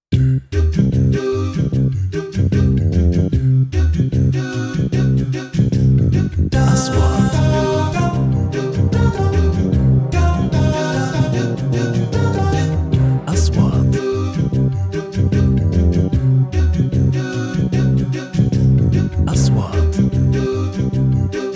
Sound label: Vocal short